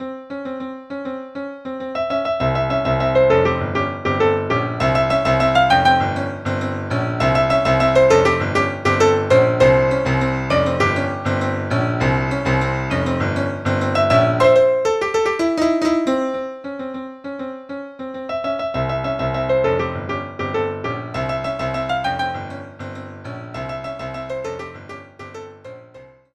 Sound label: Piano; SimCity 2000